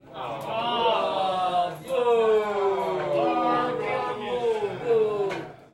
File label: Crowd, Bar Pub, Male, Boo, Aww, Friendly Disappointment; Half & Half Sound FX Pack 1